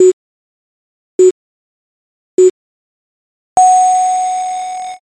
jiho

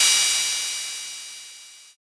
cymbal

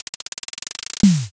drumroll

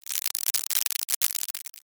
applause

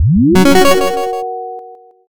boot

